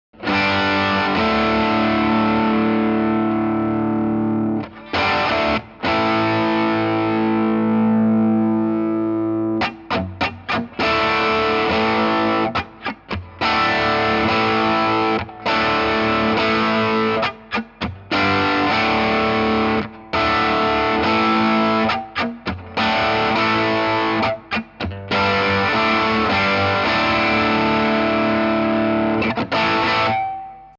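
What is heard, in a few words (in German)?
wenig zerre british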